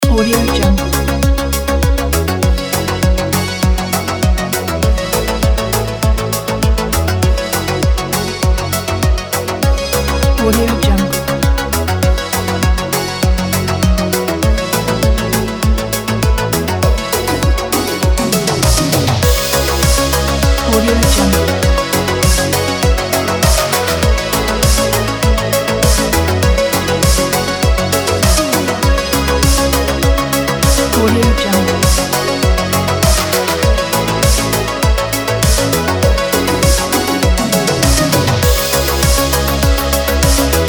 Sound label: the synthwave electronic